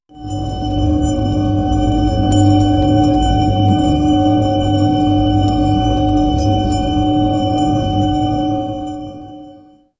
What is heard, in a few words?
a cinematic soundscape th, digital hum